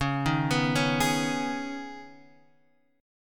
C sharp 7th Suspended 2nd C sharp Guitar Half Step Down